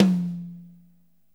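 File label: high tom; DRY KIT; Spectrasonics; Burning Grooves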